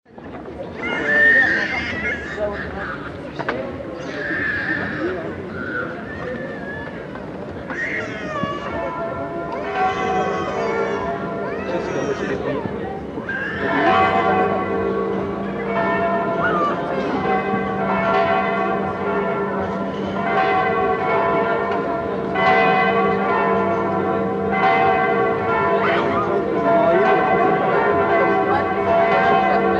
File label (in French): arts de la rue cloches foot